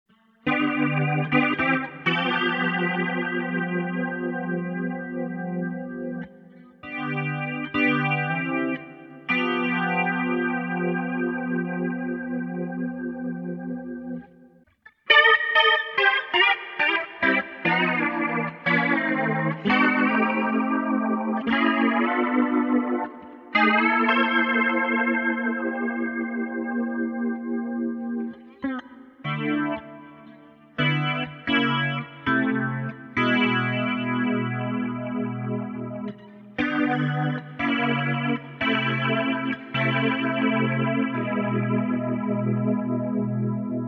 Rotary

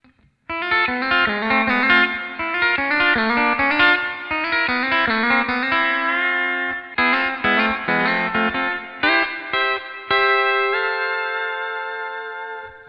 E9th Hammer Ons In F

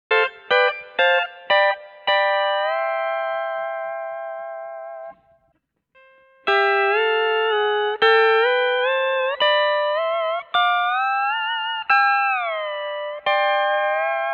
Royer, AXE FX 3

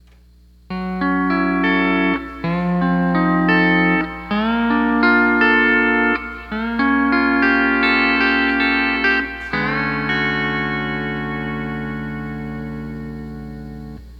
Night Life E9th

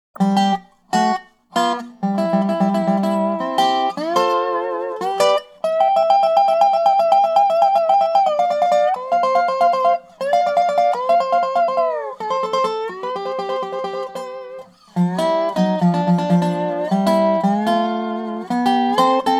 8 String Dobro

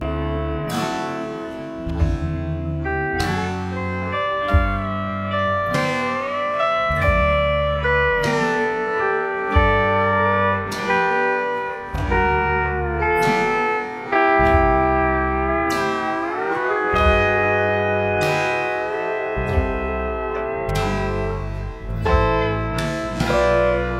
Solo 30 BPM

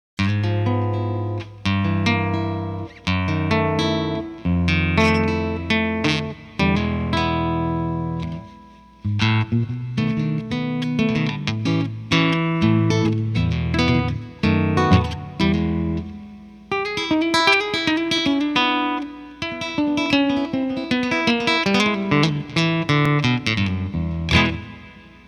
nylon electric; Silkyn Tube Amp

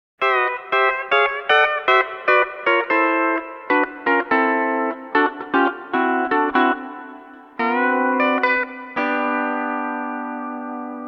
D13 No Pedals; D13 Copedant Tabs

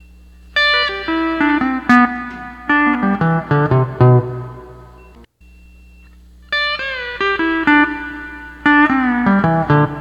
12 String Lap

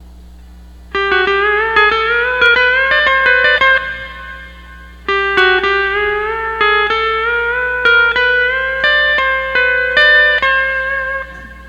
12 String Lap

